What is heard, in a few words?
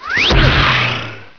polymorph, spells; humans; war2